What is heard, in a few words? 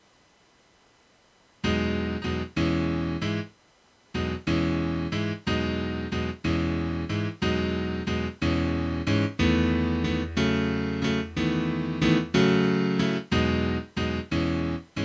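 accompaniment only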